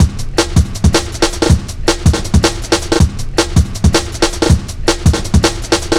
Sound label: 160, Drum Loops, Drum & Bass; Total Drum&Bass